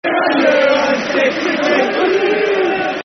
We Hate Man U (And City Too) Football Chant (Soccer Songs); Oldham Athletic Oafc